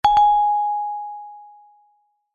multimedia alert notification ui ping chime mallet like positive